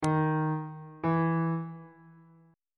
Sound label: e 8th interval pitches on bass clef